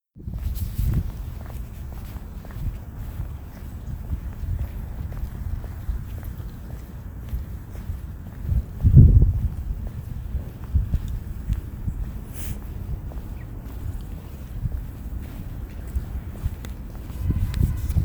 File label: Walk home